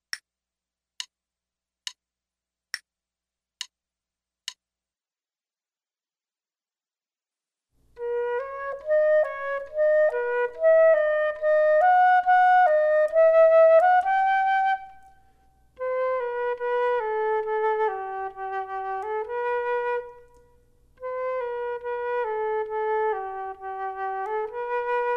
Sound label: Duets flute; Selected Duets for Flute Podcast